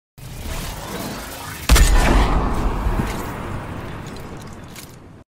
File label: railgun shot